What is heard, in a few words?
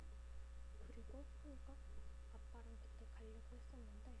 growl